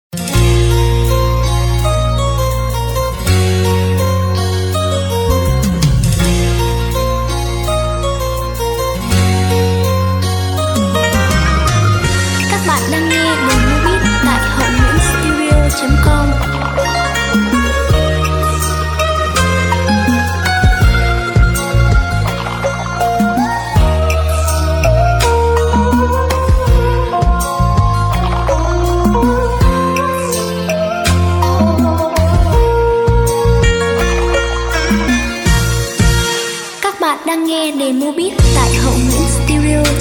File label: beat, playback